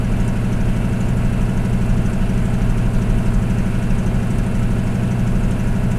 hellcat cockpit l, engines; War Thunder

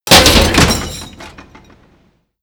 explosion mailbox; Explosions

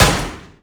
carbine 1shot indoor

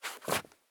turn wood; Escape from Tarkov

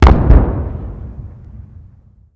Vehicle Weapons

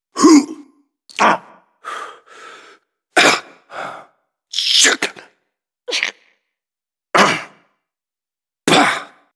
punch loop; Modern Warfare

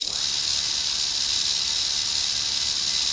minigun L dry fire; Weapons; DCS

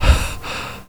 breathing

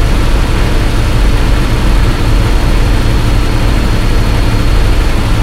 stug40 engine rpm 66, engines tanks; War Thunder

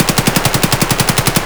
aksu close indoor loop; Escape from Tarkov

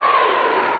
Amb Destruction; Red Orchestra 41-45

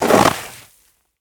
foot snow deep; footsteps

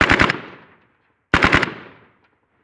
gun ak47 dist

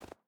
footstep barefoot snow jump start; Rust

